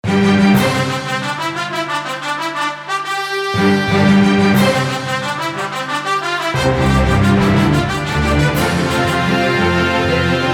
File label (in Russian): Фанфары INTRO